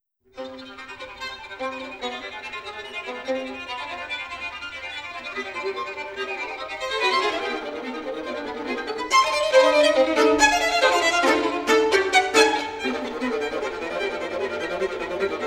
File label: Trio; COLLIARD